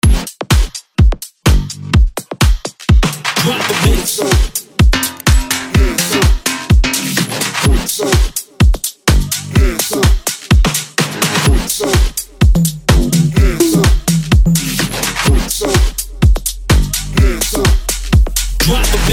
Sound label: Hype